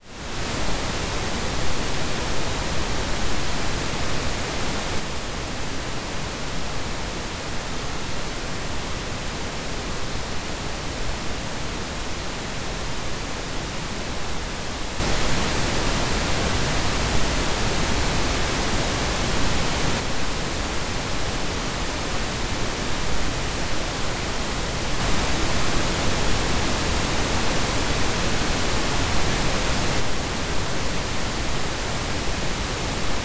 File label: pink alternating